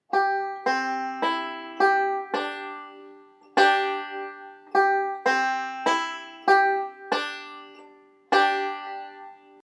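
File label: cripple creek lick, banjo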